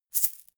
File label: shaker plastic egg hit double hard